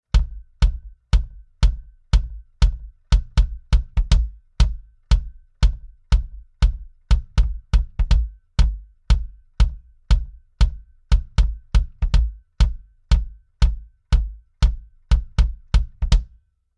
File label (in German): BD Variation mit Transformator; UnderToneAudio MPEQ-1